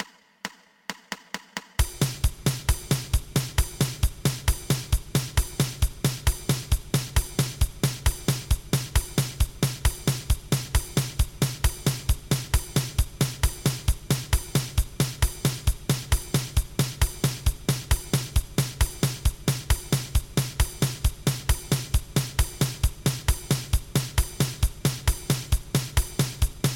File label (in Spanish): 2 pulsos